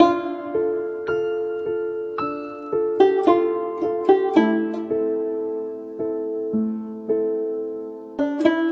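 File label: guitar ukulele; Sad; Loops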